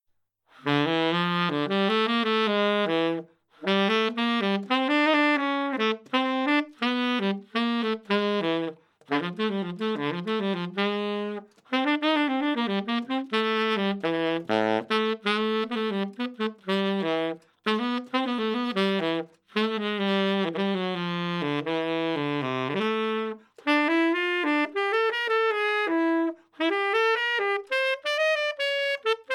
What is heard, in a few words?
tenor, saxophone